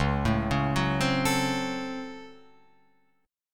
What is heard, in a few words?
Db Major 7th Suspended 4th Sharp 5th, Guitar, Open F; DbM7sus4#5; arpeggiated; 1,4,1,1,3,4